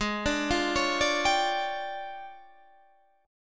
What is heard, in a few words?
D Minor Major 7th Double Flat 5th, G sharp